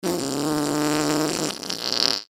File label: Human Fart Wet; Blastwave